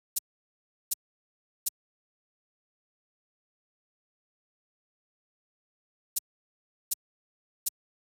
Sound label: Shaker, 080 Emin; Hip Hop; big fish audio; Hip Hop Exotica; Apple Loops; Drum Tracks